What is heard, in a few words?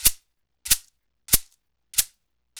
Soukous; Spectrasonics; Supreme Beats; African Contemporary